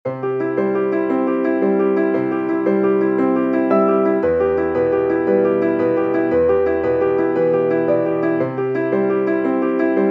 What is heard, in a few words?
inspirational piano